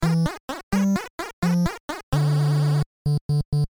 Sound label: fail; Pixel Devil and the Broken Cartridge